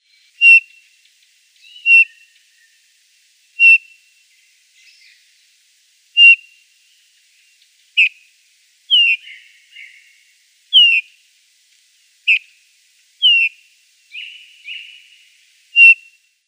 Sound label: Eurasian Bullfinch calls